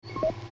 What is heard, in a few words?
ping